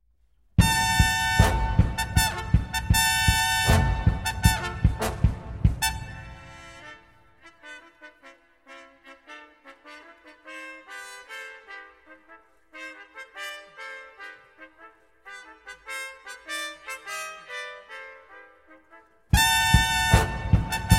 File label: Prelude, Fugue And Riffs For Clarinet And Jazz Ensemble, Prelude For The Brass; Bernstein conducts Bernstein